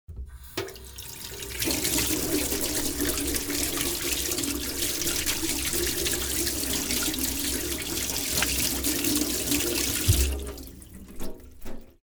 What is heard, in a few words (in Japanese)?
ohuro